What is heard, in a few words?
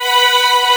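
viola loop; E-MU Producer Series Vol. 5 – 3-D Audio Collection; 3DSynths; 3DAprilBreeze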